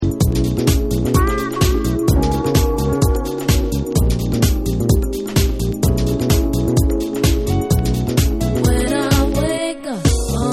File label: Classic Vocal Mix